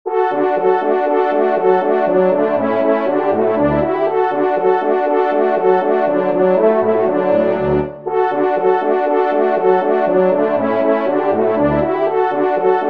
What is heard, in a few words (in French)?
TRIO, Allegro, trompe